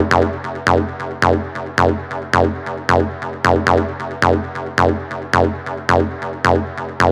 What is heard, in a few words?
Synth Loop F 135, Techno; Ghosthack; AC2024 - Day 1 - Techno Sounds 2025